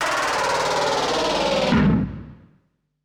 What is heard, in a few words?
SPACE FX 140; SpaceSounds